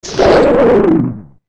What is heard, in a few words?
Hit; Gyorg getting hit